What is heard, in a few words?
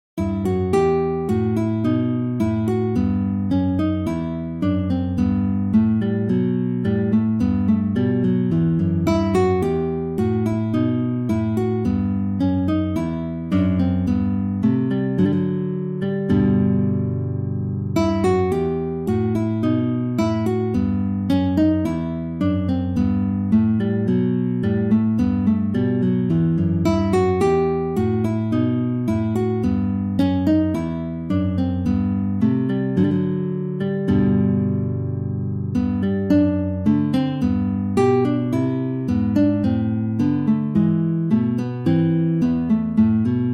bourree in e minor guitar; bach